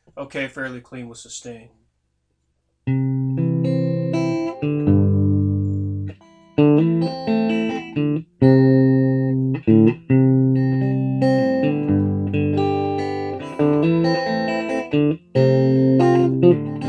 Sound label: clean sustain